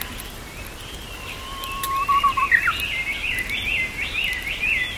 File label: uguis